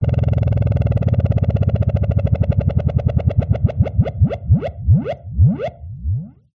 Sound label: Drones Synth Laser Drone; sound spark